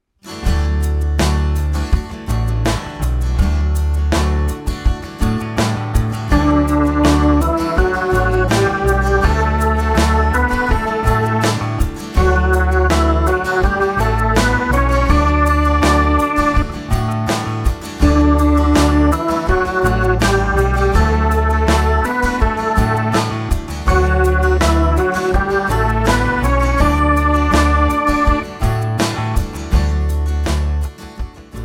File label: melodic dictation